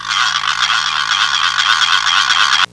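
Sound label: noisemaker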